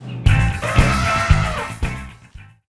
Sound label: ui game disco; Ringling Bros. and Barnum & Bailey; Wii